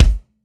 Kick, Live Trip Hop Drums; Drum Samples; Smoke Signal

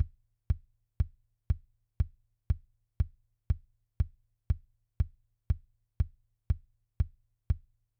Kick 8 Beats

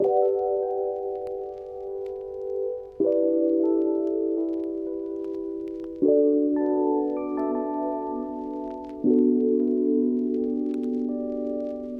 G a nice rhodes loop, Electric Piano; Electric Piano Rhoads; Various Keys

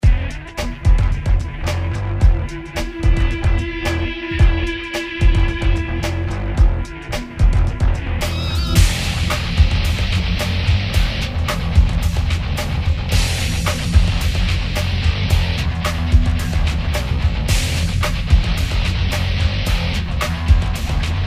background pads

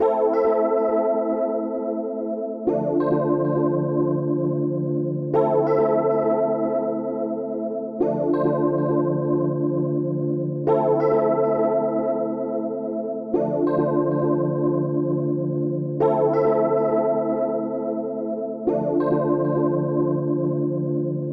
Am to Dm rhodes loop; Electric Piano; molly water; Electric Piano Rhoads; Various Keys

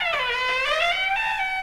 door

